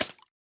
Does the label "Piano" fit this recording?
No